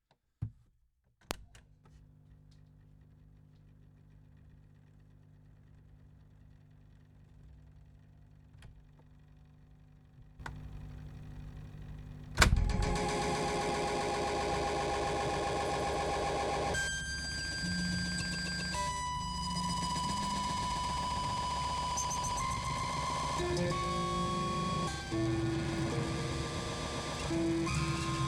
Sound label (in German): Echo Mode (Lautsprecher Tonband), Grundig TK 747; Komposition; soundcheck